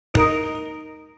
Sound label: victory medal normal; Replay VHS is not dead